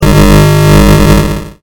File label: wiggly